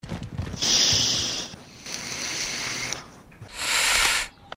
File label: inhale